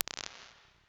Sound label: room, chambers and large rooms; Ableton; Convolution Reverb